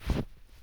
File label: step snow